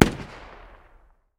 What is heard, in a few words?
ak 762 medium distance; tacz-tweak-pack; taczmechanics